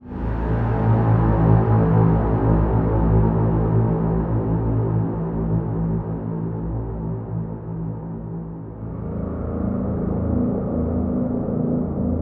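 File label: ambience doom drones mood repeater; SoundMorph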